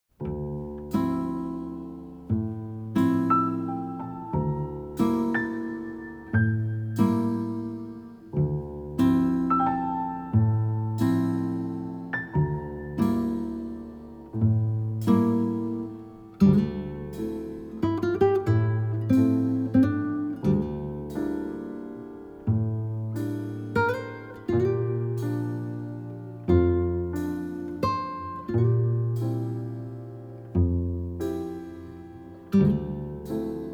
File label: Labyrinth Azure Rainforest Dungeon, live music by piano and strings; Etrian Odyssey I II Super Arrange Version; Dungeon 11F~15F